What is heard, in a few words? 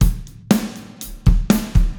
Closed Hats 120